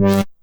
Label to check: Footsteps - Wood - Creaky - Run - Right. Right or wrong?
Wrong